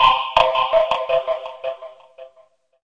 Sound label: 169 vibrado echo foam, drum loops and breaks; 161 to 180 bpm